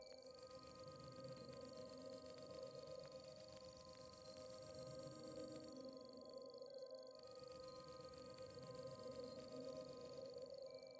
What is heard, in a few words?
VR Ambience; PCBS; Aorus